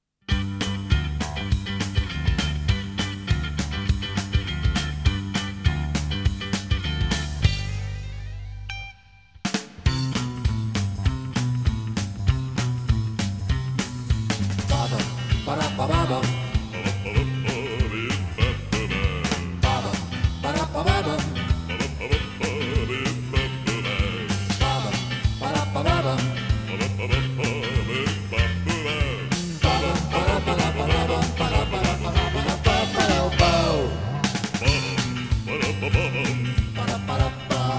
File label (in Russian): Вокализ (Паба-паба-ба) (Марш парад); Трубадур, Бременские музыканты